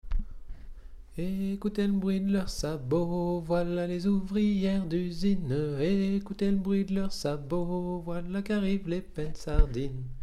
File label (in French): lead sur refrain; Penn Sardin